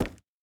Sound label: boar footstep wood hard; Rust